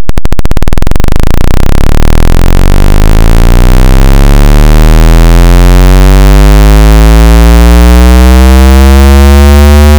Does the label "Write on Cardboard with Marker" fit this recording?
No